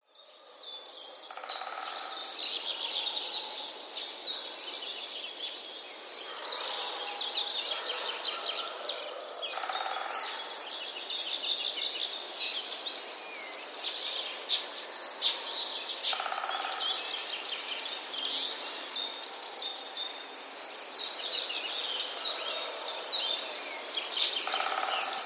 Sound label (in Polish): spiew